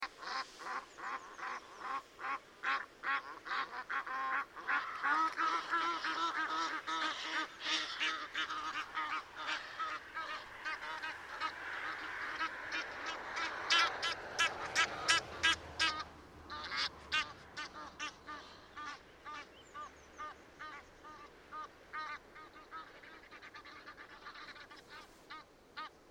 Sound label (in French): ouette d egypte; chant